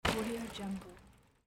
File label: bicycle landing after jump; urban sounds